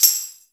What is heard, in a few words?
DRMS, Pure Electro; USB Soundscan vol.03; AKAI